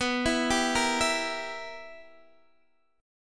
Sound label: B Major 7th Suspended 4th Sharp 5th, Guitar Open D chord